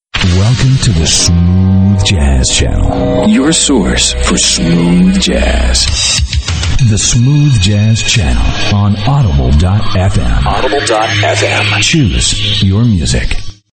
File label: Smooth Jazz; Audible FM; ADULT CONTEMPORARY; RADIO IMAGING